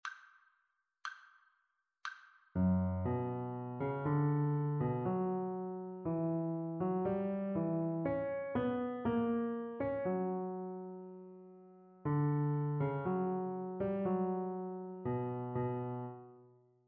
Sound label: melodic dictation